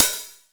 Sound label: HH OP, Drum Machines; 300 Drum Machines